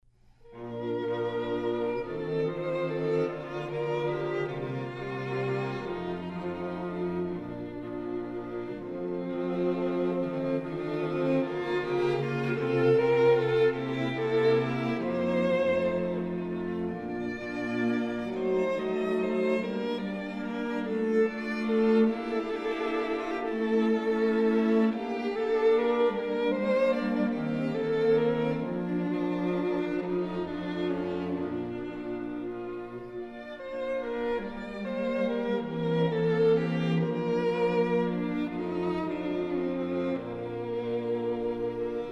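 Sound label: string quartet; foote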